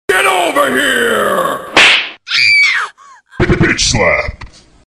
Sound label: Slap; Get Over Here